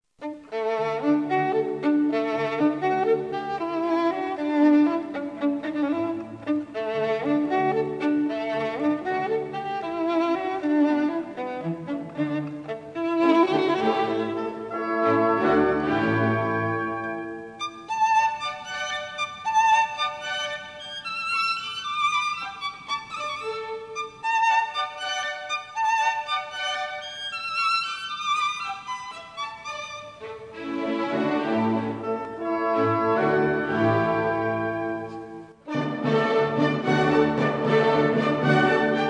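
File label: violin, D major; beethoven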